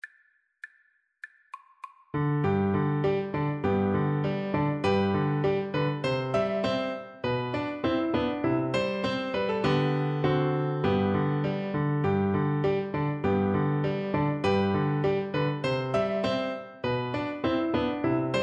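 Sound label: recorder karaoke; dancing master newcastle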